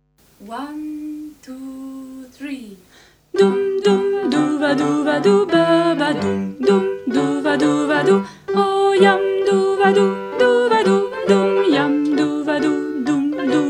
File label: Polonaise bass